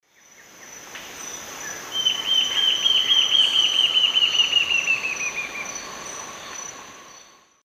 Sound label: Lepidocolaptes albolineatus, song; S. America; Eastern Lowlands